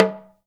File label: TALK DRM, Heart of Africa; Spectrasonics